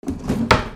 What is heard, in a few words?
KITCHEN